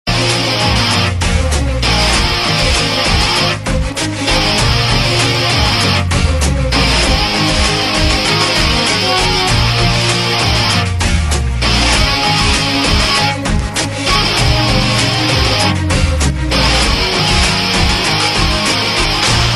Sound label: Theme Music; Junoon; Tv Serials; Life OK; Junoon Aisi Nafrat Toh Kaisa Ishq